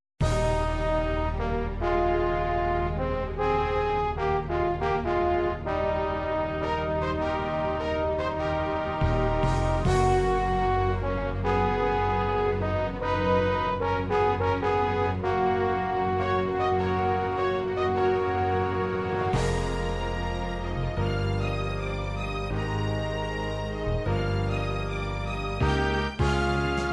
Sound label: anime chacha